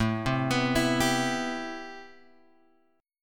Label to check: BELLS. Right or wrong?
Wrong